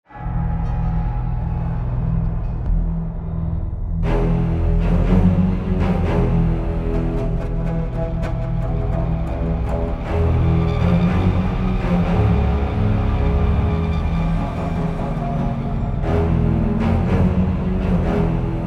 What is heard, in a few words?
horror thrill